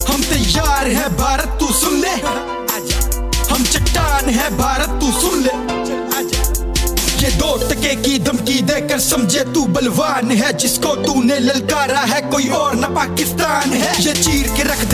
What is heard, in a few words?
Pak Army song